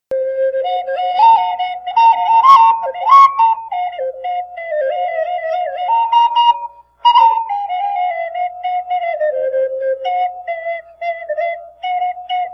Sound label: tonette swing riff